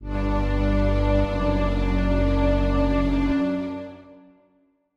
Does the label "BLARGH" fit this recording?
No